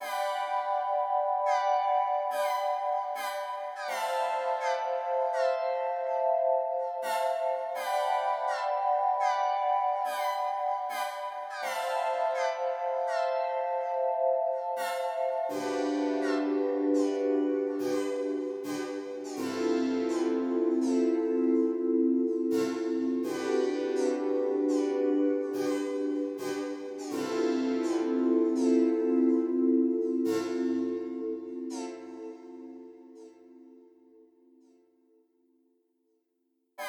Guitars Pad

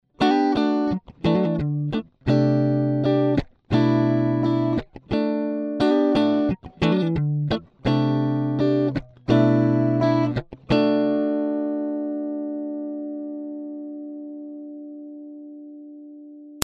AC30 crunch

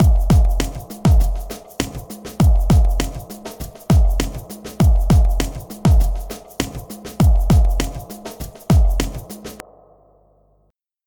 Hip Hop Drums Spring Verb; PerfectSpace

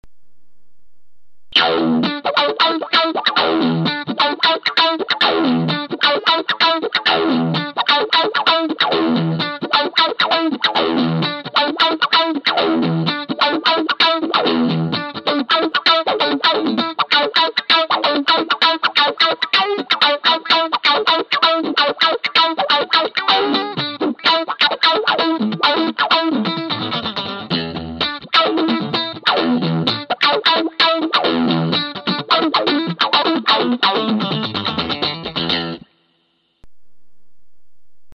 Chunk Rhythm TubeZipper

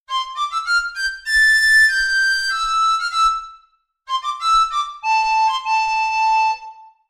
Recorder

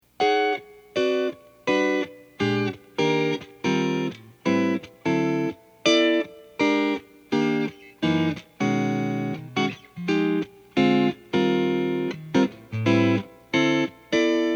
Blues

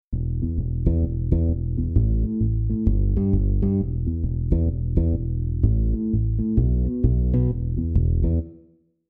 acoustic elec comp

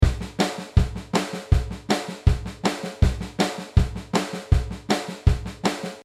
Rock n Roll 160

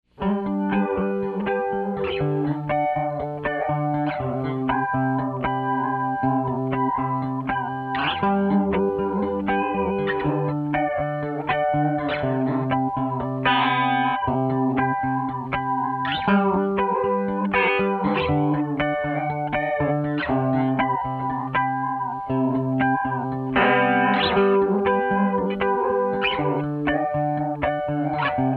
harmonics; Hoaxed

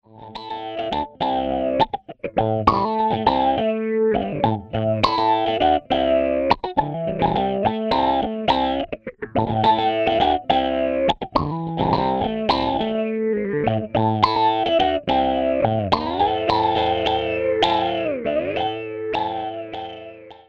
Bass Balls

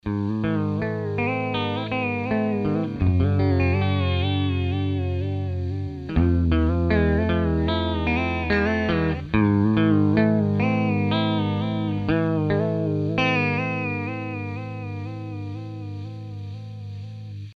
med vib speed

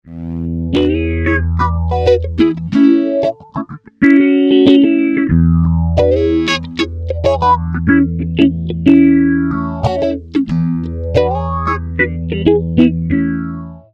Small Stone

